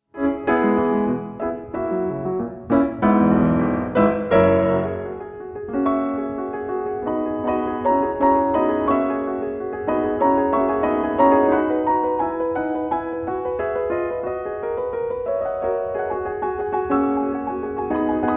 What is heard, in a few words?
piano sonata in e minor; ludwig van beethoven; op 90